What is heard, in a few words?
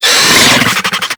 Infested Charger Attack; Warframe